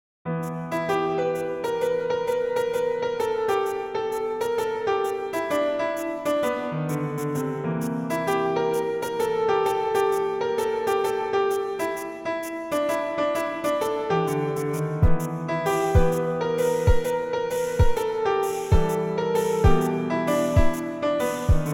Jazztime

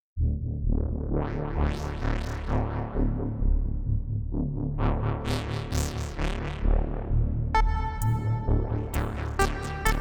Ambience

